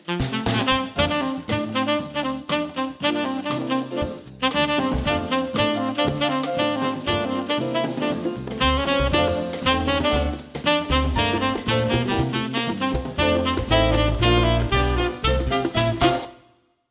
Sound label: gated bass; jazz concert